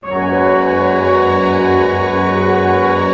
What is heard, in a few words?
orchestra chord cluster pentatonic; Roland LCDP08 Symphony Orchestra